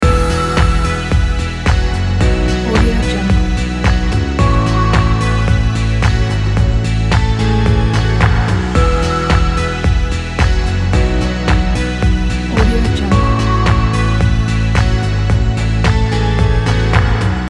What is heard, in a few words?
positive loop